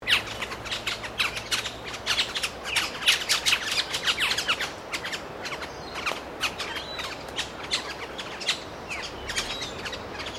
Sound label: Bird Fig Bird Call, Birds in Queensland; Animals